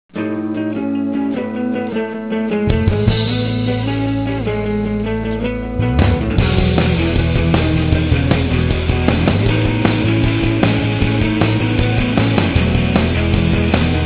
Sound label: loop